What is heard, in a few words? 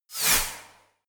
wipe